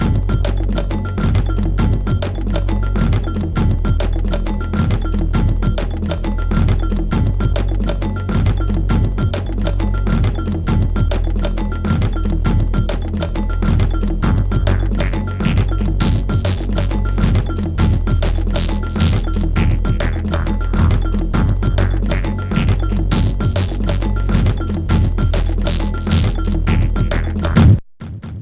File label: somalisk trance